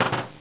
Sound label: KNOCK; homm2